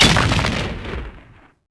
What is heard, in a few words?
Explode; Ravenswitch